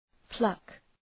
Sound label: pluck